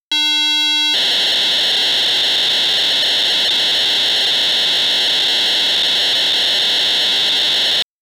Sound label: PO-32 data transfer sounds; Tonic Drums